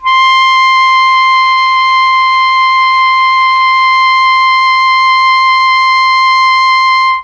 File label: C4 ALTO SAX, mellotron; CHMB ALTOSAX